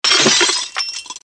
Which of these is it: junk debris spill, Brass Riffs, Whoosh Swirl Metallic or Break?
Break